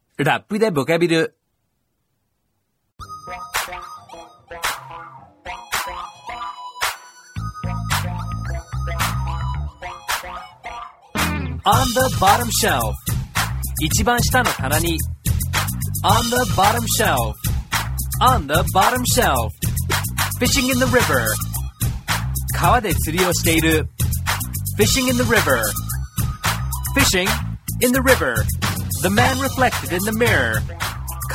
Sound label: rap english